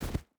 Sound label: footstep barefoot snow jump land; Rust